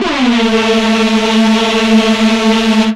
technosyn2, Techno Trance; Ueberschall; SYNTH

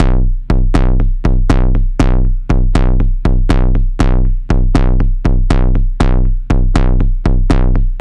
Structured Rhythm, IDM Rhythms; Sonic Foundry (Sony Creative Software); Twine-IDM

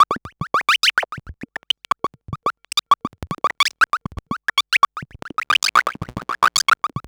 Droids

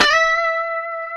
E 5, COMPR STRAT, Guitars; Spectrasonics; Hans Zimmer Guitars VOL-1

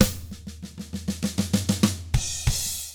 HARD ROCK, Drums; 96HARD ROCK

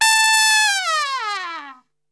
A 3, Brass; Best Service; Brass Super Section; TRP LNGFLL; 90 s Sample CDs

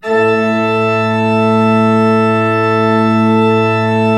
A1, Cathedral Organ; ILIO; Propeller Islands Cathedral Organ

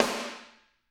SD, Drums; Bob Clearmountain Drums I

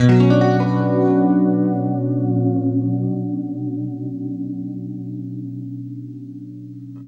FLESH CHORDS, Guitar

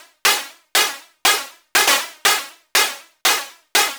Anabeat Snare

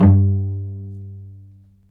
STR VC, Vcs Pz dry; STR Vcs Marc-Piz

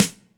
HI EDGE DRUM; 90 sSampleCDs; Crypton - DrumBase5000

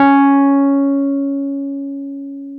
PNO Wurly; Keyboards of The 60's and 70's

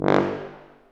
TUBA, Tuba stacc; Roland L-CDX-03 Disk 2

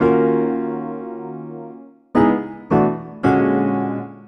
Keyboard

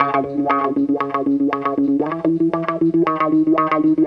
GTR, Roots Disco; C#M; USB Soundscan vol.32; AKAI

